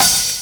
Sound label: hi-hat; Univers Sons - Kitch 70s